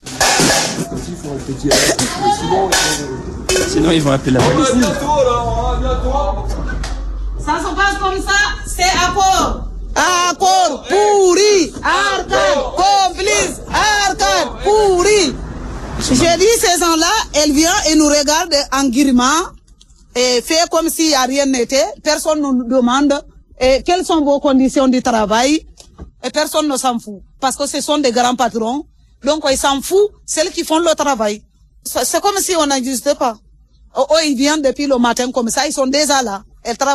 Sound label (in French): Greve ACCOR manif